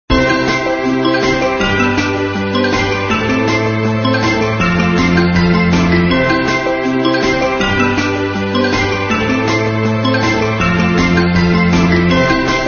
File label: menu music; Super Adventure Pals